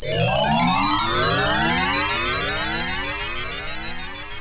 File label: level up